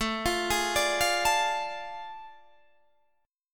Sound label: A Major 7th Suspended 4th Sharp 5th, Guitar, Standard; AM7sus4#5; arpeggiated; JGuitar